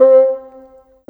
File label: Bassoon, Cinematic; Sonic Foundry (Sony Creative Software); Orchestral Series VOL-3